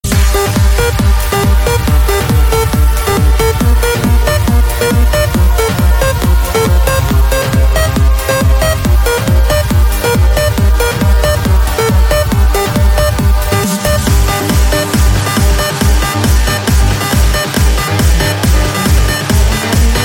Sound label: Intense Trance